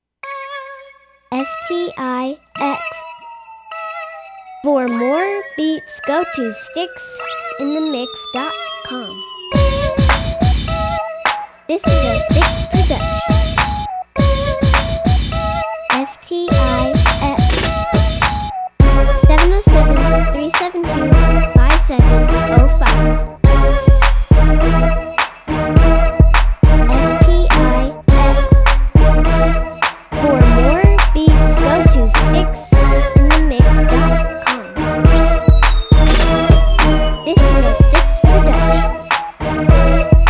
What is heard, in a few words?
crunk it up